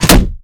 riot shield impact; weapons; melee; Modern Warfare

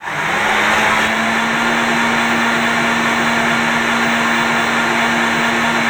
FW-190D9 Flaps; DCS

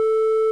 Aircrafts Cockpits RWR Search Warning; DCS

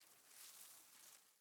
leaf rustle grassy soft

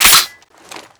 reload open, foley; m1887

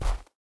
bear footstep snow hard; Rust

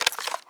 ammobox remove; Rust

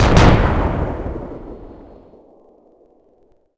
Mech Infantry Fire; Civ4 warlords

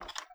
shutter wood open end; Rust

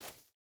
footstep burlap dirt jump start; Rust

